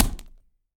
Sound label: wood, smashing; 1000fx